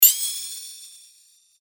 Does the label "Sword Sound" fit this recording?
Yes